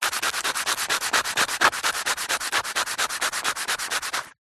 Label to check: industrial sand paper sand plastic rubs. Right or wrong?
Right